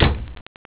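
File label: door close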